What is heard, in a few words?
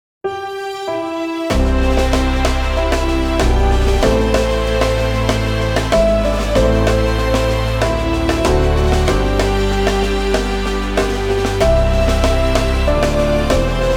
national anthem modernized